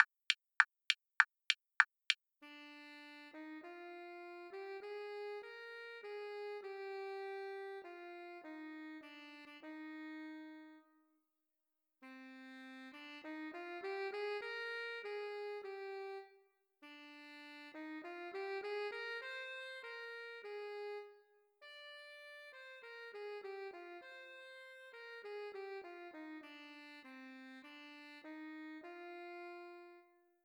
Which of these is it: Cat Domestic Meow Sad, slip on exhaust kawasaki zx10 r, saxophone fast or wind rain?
saxophone fast